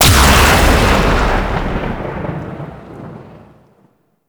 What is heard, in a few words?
Drop Beam Fire; Enemies; Weapons; Warframe; Tau; Sentient Dactolyst